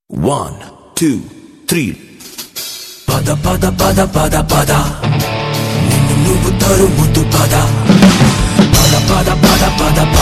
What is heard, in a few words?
Telugu Ringtone